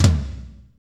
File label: tom, fast shuffle, Roland